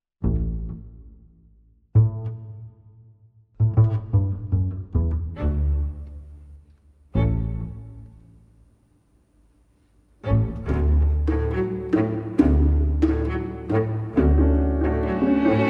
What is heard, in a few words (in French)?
instrumental intro